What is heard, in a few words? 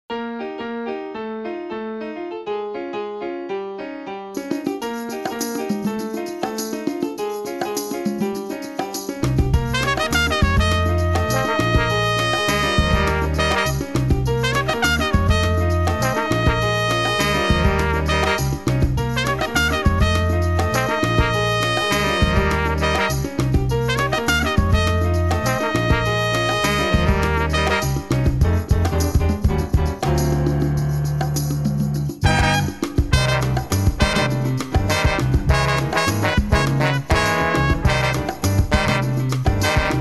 Salsa, horns